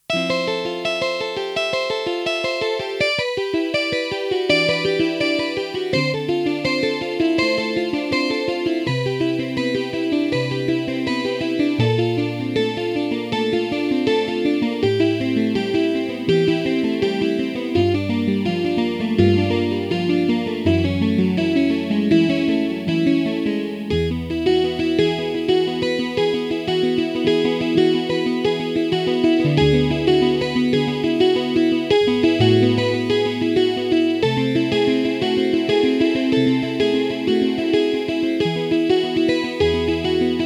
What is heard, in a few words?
Yamaha SPX90 Phaser RMI